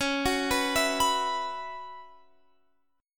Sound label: B Suspended 2nd Suspended 4th